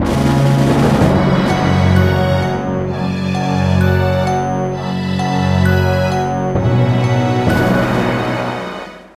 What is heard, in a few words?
Intro (Fanfare); super mario strikers gamecube; Gazette